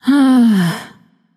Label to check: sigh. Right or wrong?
Right